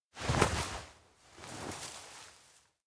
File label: sit in overgrown grass; anemoi